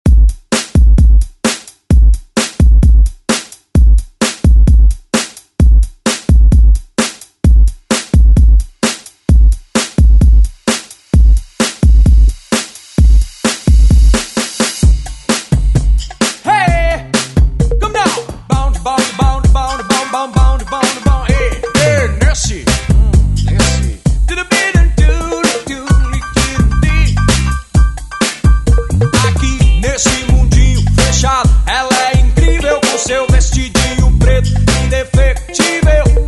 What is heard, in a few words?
90s ReDrum; DJ Mhark